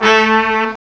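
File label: sax section; CMI Sound Library Rev 1.3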